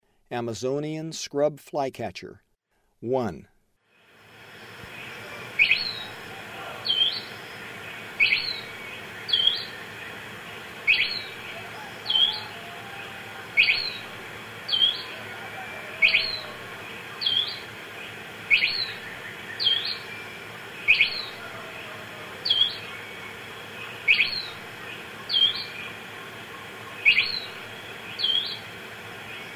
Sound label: Amazonian Scrub-Flycatcher; S. America; Eastern Lowlands